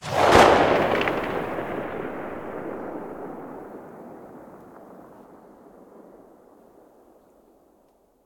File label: mosin muzzle mono far, weapons